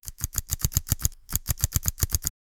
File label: typewriter keys clacking